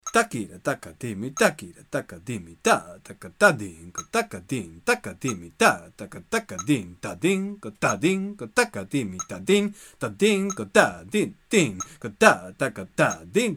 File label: gathi bhedam misra jathi